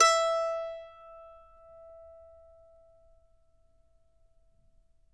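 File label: mando notes E open; Sampleheads; Whole Lotta Country 1 & 2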